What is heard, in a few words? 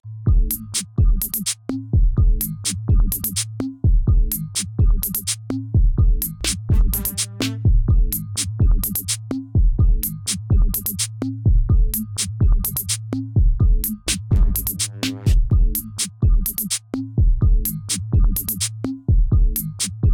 Rap Loop; Jackbox Party Pack 5; Mad Verse City